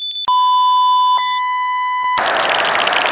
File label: click terminated; combine soldier; hl2